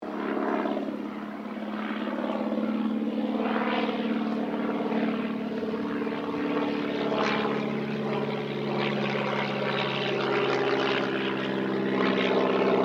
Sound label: AIRCRAFT DH82A DEHAVILAND TIGERMOTH PASS